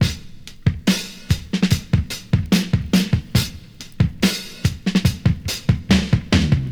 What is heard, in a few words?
71 bpm breakbeat b key; drum loops